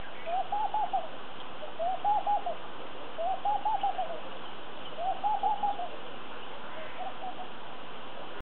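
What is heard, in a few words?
laughing dove voice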